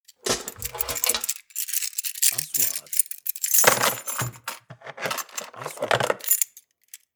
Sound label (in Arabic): مفاتيح معدنية; sound effect